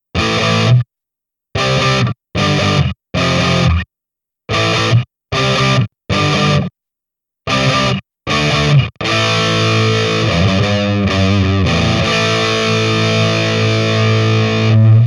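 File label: Higain 4x12 JCM800, guitar; Metal Tone with 4x12 Cab; GD101X; 32-bit DSP (SHARC)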